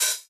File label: Hihat Closed Short Hit